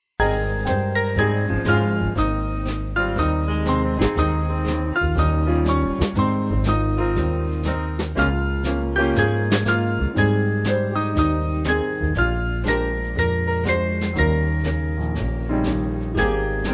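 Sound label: Band, Music Backing Files for Churches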